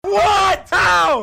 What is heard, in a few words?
what how screaming